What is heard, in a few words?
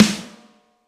drum hit whistle; osu